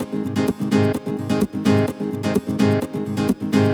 Nylon Guitar G# maj, 128BPM; Vengeance Samples; VEH3 Nylon Guitar Kit 2

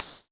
Cymbals Open HH; Vengeance Samples

